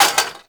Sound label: Cafe Chair Short; Warframe; Nineteen Ninety Nine